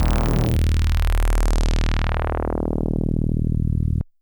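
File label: DX100 D#0; rocket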